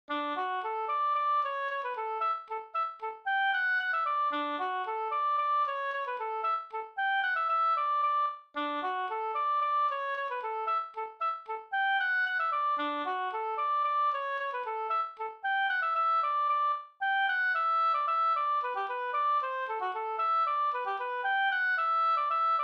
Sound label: polka